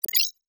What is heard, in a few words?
ui teleport menu open